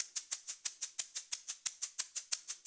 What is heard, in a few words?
Egg Shaker; Rock; Percussion